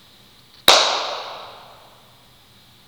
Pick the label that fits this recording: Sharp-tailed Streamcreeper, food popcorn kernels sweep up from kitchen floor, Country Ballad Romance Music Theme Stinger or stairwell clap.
stairwell clap